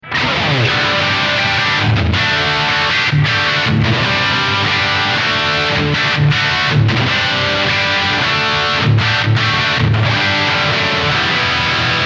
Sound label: High Gain Low Focus Mid